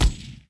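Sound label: Mch Gun Fire, machine gun fire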